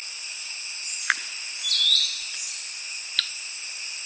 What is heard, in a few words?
forest elaenia; پرنده الینیای جنگلی